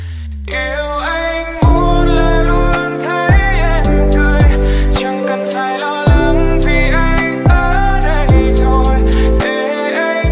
Nhac Chuong Lofi

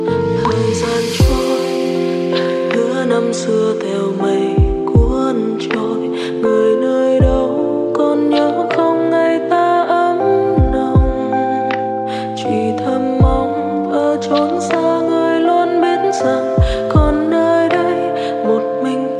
Nhac Chuong Lofi

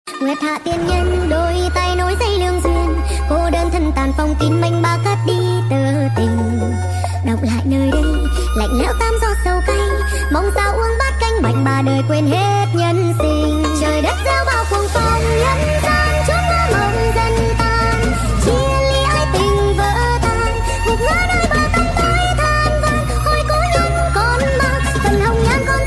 nhac chuong remix

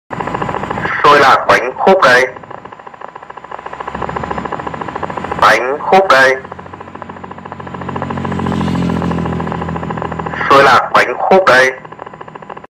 xôi lạc bánh khúc dạy tiếng rao

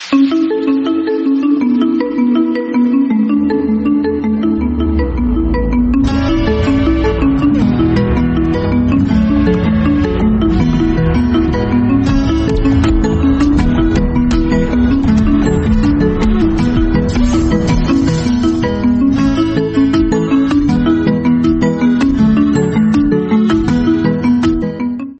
khong loi xiaomi redmi 11a

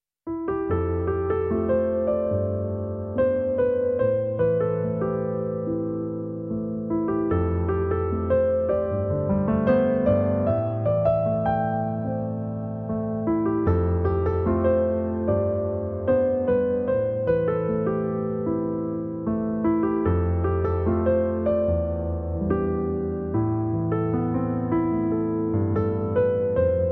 nhac chuong khong loi piano

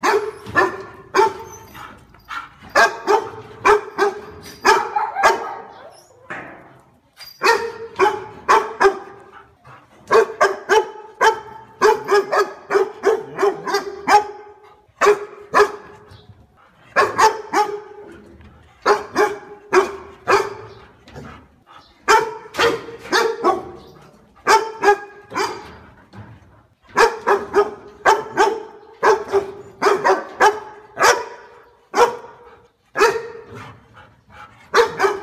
Tieng Cho sua hay va am

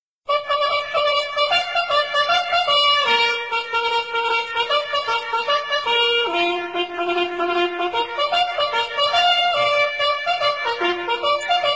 Nhac Chuong Bao Thuc Trong Quan Doi

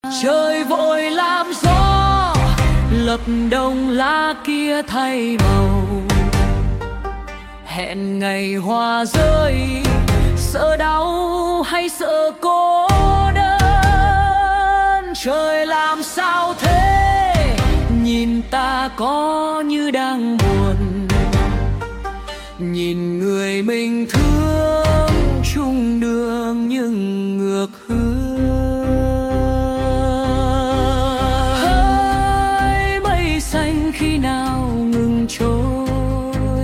giọng nữ AI cover rock

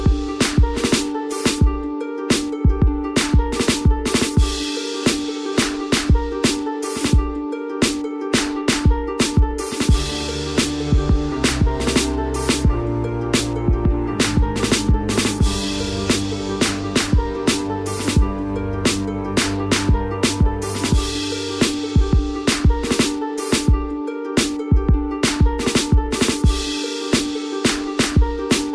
không lời instrumental

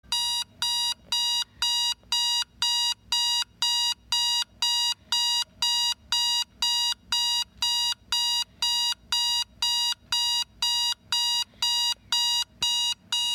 tieng dong ho bao thuc co dien; keu; nhac chuong

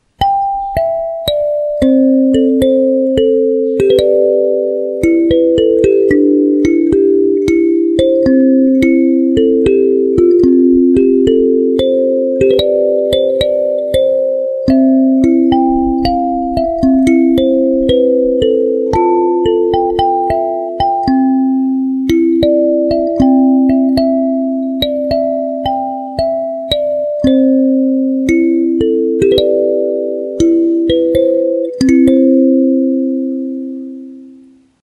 kalimba

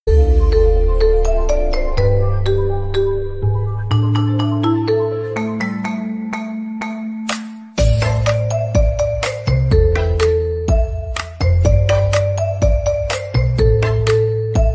Khong Loi Nhac Chuong

